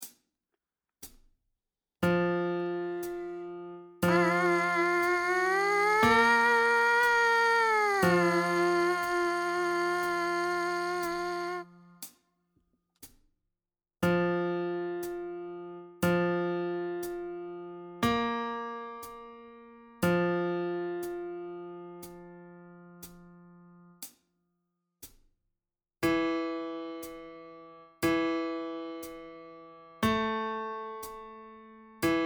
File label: Mpc buzz; trumpet; Beginning Trumpet